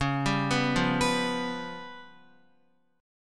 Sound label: Db 7th Flat 5th, Guitar; Db7b5 arpeggiated; JGuitar